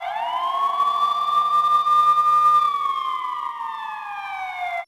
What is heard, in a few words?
siren loop; Max Payne; tenements